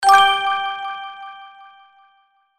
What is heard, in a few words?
plinko result